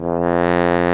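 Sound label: brass baritone; horns; Roland - LA Composer vol. 1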